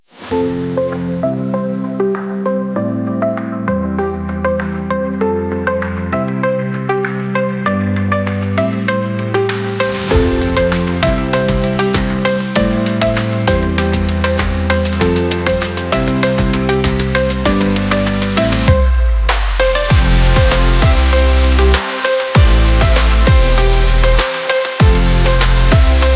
Happy Upbeat

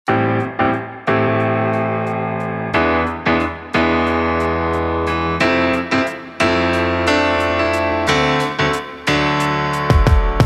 Hip Hop, relaxing lo-fi; That Hip Hop

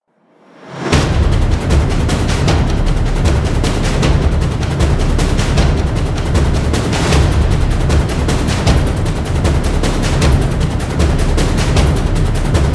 Drums Trailer; intense drum beats

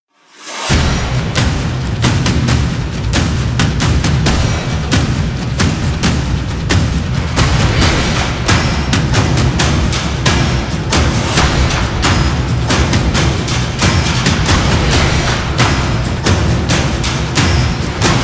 Action Percussion Drums; intense drum beats

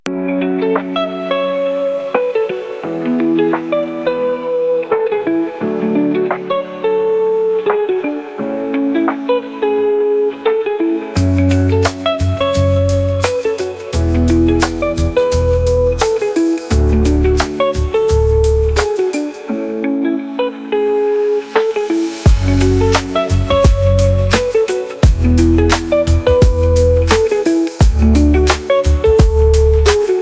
Lofi Cozy; relaxing lo-fi

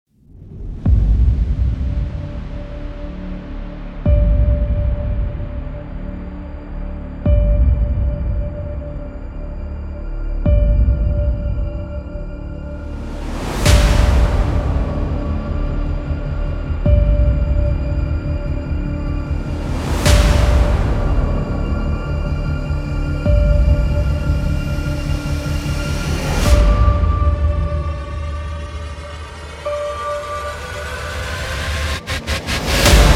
Cinematic Epic; epic movie trailer music

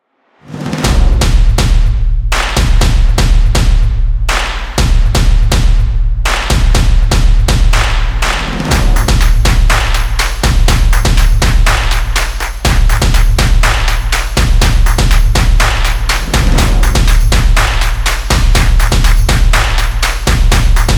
Stomp And Claps Drums, energetic percussion music

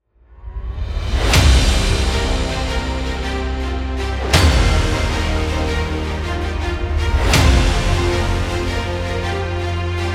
Dubstep Trailer; powerful dubstep tracks

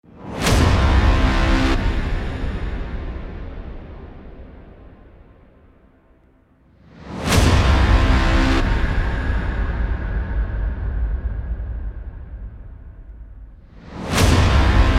Action War Trailer; intense trailer themes

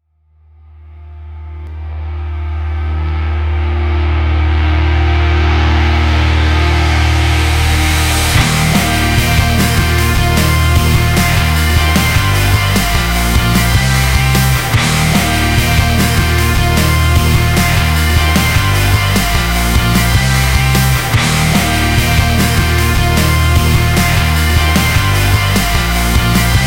Inspiration Rock, powerful rock anthems

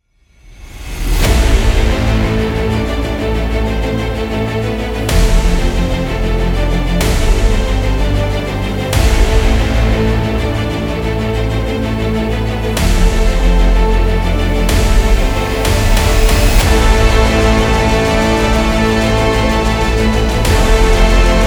Adventure Travel Epic; epic movie trailer music

